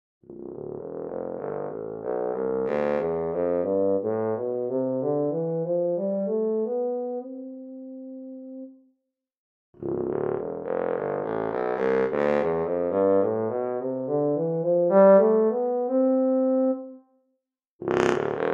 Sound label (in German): Bassposaune Bucket